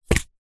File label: leisure boomerang wooden catch single hand